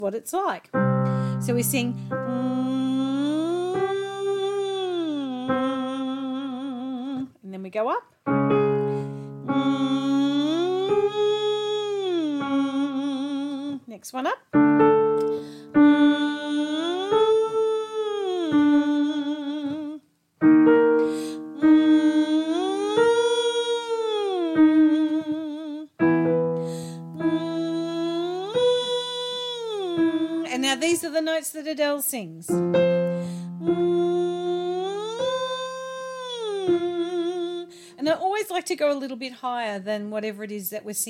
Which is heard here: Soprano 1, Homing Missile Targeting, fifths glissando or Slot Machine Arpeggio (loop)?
fifths glissando